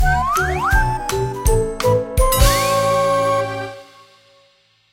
Bouncy Birthday (Intro); Cooking Dash

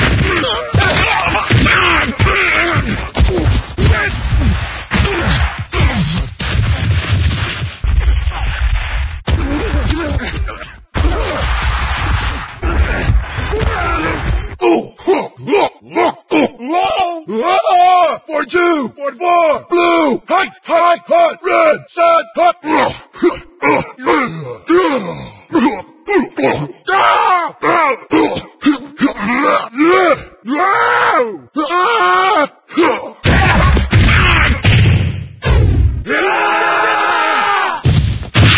SFX Collection; nfl blitz n64 ps1 gamerip 1998

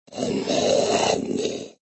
zombie groan

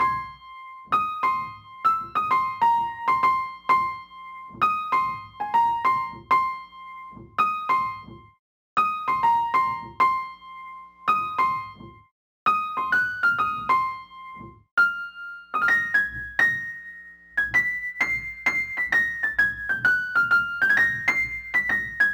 Cemetery Loop Piano

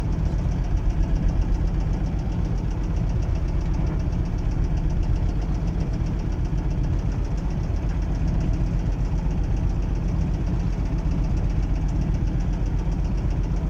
vehicle fishingboat idle

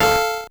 core squish F3 A2

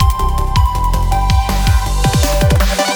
Snow Transition; sky rogue

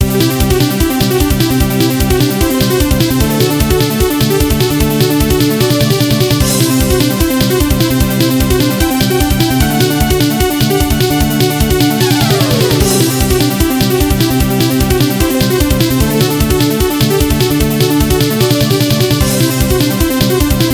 Last Boss; Gradius 2; MSX

